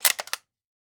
Weapon Gun Machine Gun 9mm Bolt Release; AdobeCC

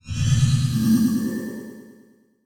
Flash Whoosh Multiple; Multimedia Internet CD-Rom Flash

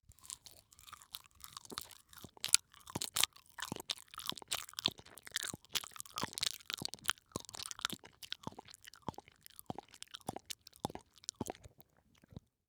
Human Chew Banana

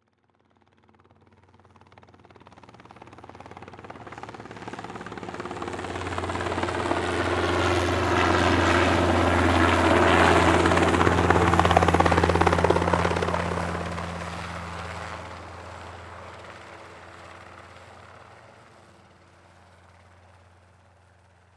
Transportation Helicopter Fly By

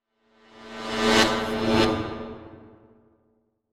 Transition Whoosh Multiple

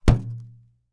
Cartoon Balloon Hit Hand Hit Single; AdobeCC

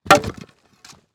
Impact Wood Chop

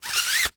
Weapon Sword Place In Nylon Holster; AdobeCC

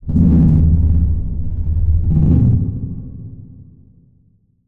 Transition Hit Impact Drone; Adobe; Production Element

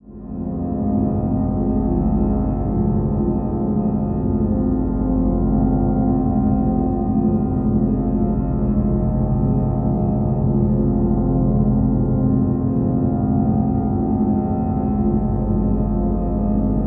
Drone Keyboard Synth Tone Steady Feedback; Production Element Imaging; AdobeCC

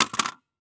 Industry Tool Staple Gun Set On Pavement

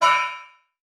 Multimedia Internet CD-Rom Flash Ding

